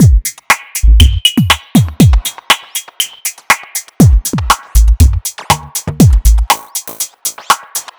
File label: Beat, Drum Loop; Bomb Klink Beat; Sonic Foundry (Sony Creative Software); The Electronic Music Manuscript Collection; Drum Loop Structures